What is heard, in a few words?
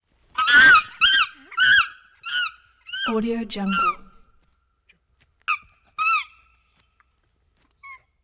chimpanzee exterior slightly upset animal